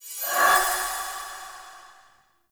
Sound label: Ward Cast Flock Accent; Powers; Fey; Founders Ward